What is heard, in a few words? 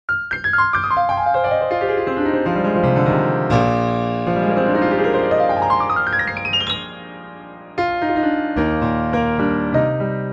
Steinway D, piano; pianoteq